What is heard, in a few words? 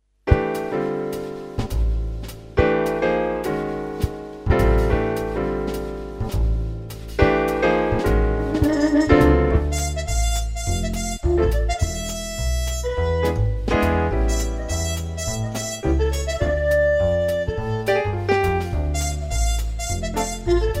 brass minus